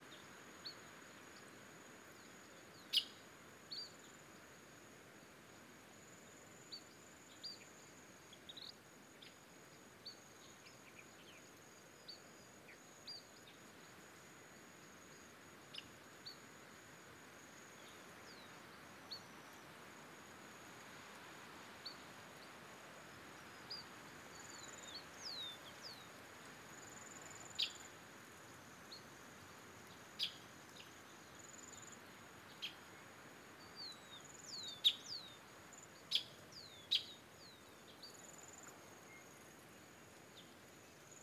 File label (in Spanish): AVES EN LAGUNA DE ÑAPIQUE PIURA; animal; Gaviota; paloma